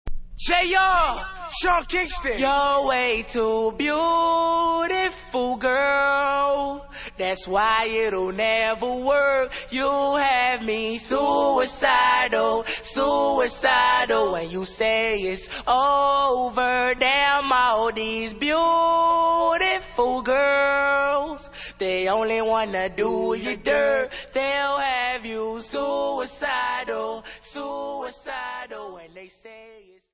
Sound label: acappella